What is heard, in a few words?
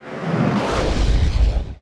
Missile